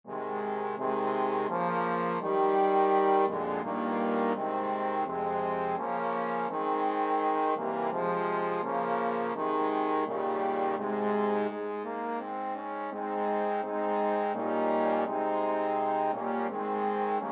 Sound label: god save the queen, trombone quartet